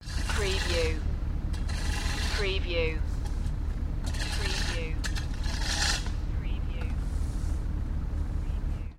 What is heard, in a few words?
Road Crew